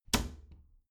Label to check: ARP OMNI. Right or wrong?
Wrong